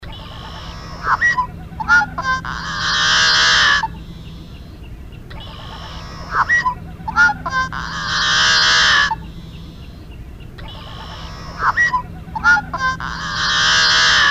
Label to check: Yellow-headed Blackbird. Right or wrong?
Right